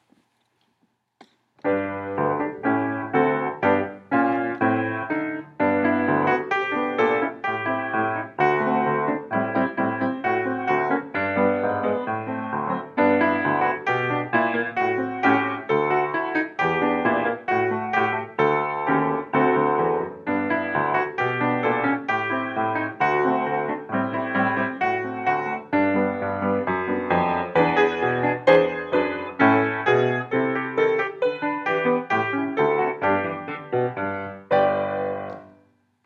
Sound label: accompaniment